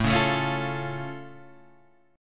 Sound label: A Augmented A down Guitar Open G